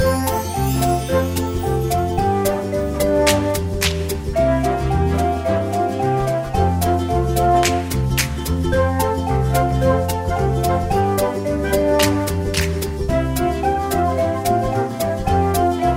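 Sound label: instrumental version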